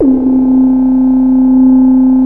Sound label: beginloop, synth; Keith Emerson Signature Series; BEGINNING